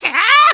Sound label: Spin Attack; Link's spin-attack shouts